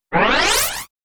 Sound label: Fade in flange